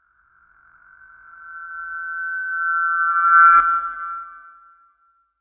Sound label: Whistling Approach